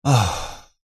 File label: male middle aged exhale sigh moan